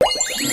pop; 音MAD; 孤独摇滚

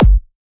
Dr Kick

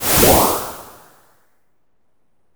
MAP ZOOM; maimai

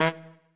Trumpet melo

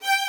violin

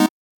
Chord

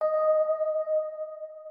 organ

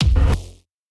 breakbeat

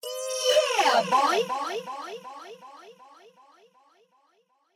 vox

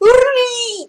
WRYYYY; 音MAD; Cookie

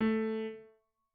piano

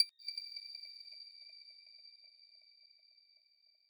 arp

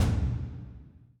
drums

